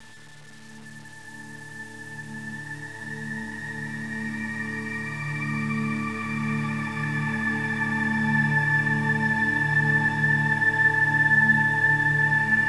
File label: end sad; aomdc